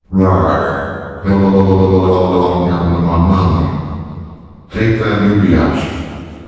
scarey monster; ambience

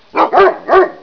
dog bark

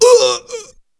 police die

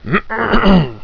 ahem

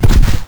body fall

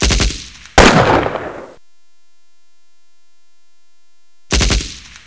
war zone

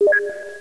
radio signal

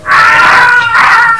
barnacle die

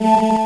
health

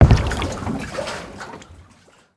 water wood step

